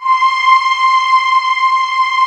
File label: HOLD VOX C5, Choir; USB Soundscan vol.28 - Choir Acoustic & Synth; AKAI; HOLD VOXS